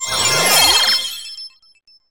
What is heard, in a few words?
Misc Get Chime; P5